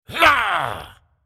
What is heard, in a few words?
effort; Ryze; Character Voices - English